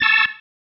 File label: Stab, Organ, One Shots